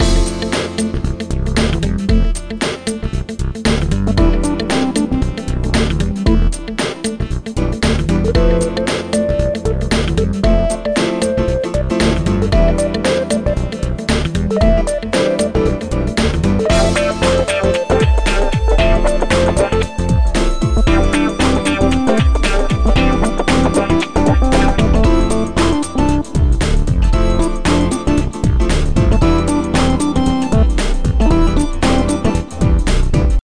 Dance; Barbie in the 12 Dancing Princesses; PS2

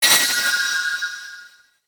Beat

